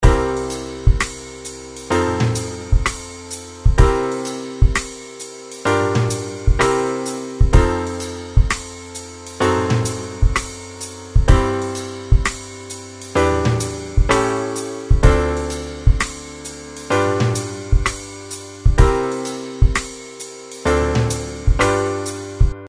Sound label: bebop blues, blues chord progressions